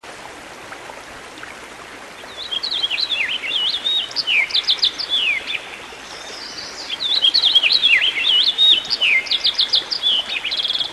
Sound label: song bird, Birdsong